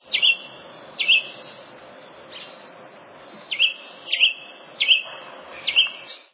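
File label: tanager whistle with pauses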